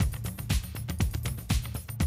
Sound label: 120, Classic Drum Loops